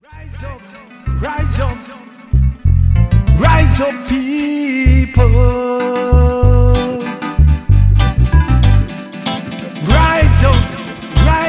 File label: Rise Up Dub Vox